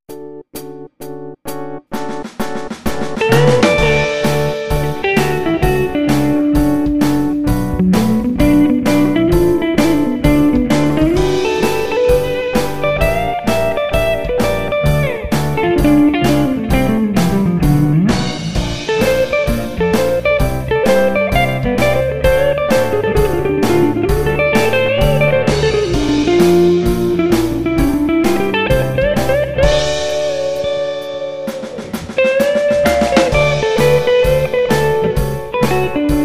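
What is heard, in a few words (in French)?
Style pop; guitare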